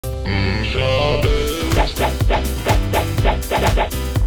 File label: ahh; roblox